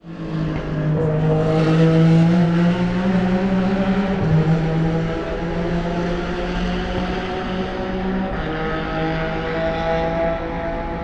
various cars before tertre rouge sunday

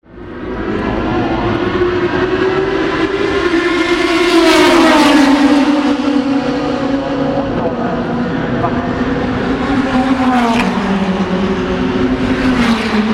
atmosphere at straight, le mans

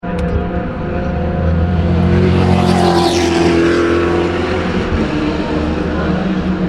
spyker in tertre rouge

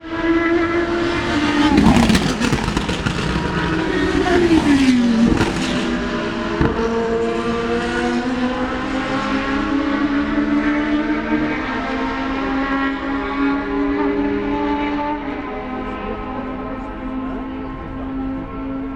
v10 before tertre rouge sunday

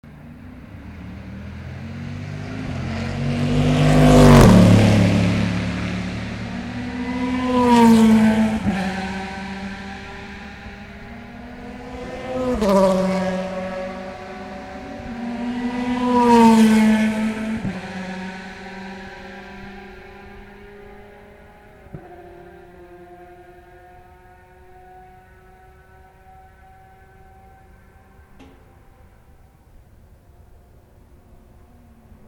Corvette Ferrari Car Car after Mulsanne Corner; Saturday afternoon

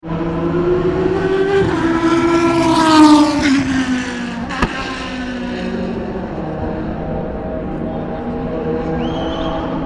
ferrari f430 esses

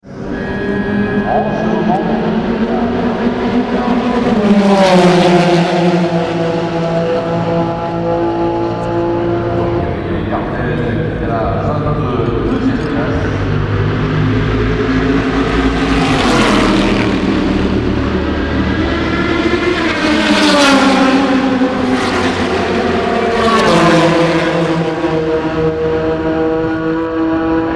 atmosphere at straight, le mans

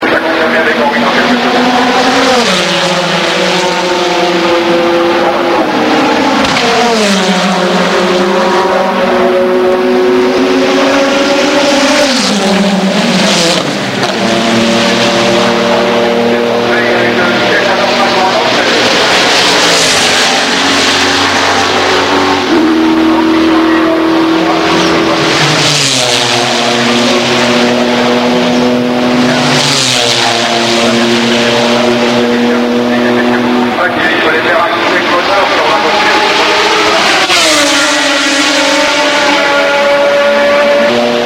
bmw pitstop

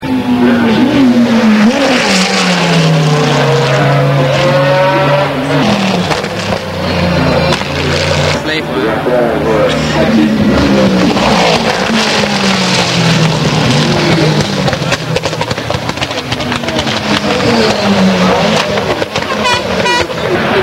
panoz og bmw

